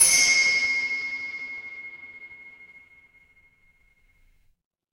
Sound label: instrument bell